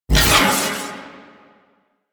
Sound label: Proj Fully Charged Whoosh; Warframe; The New War; Quests; Weapons; Omicrus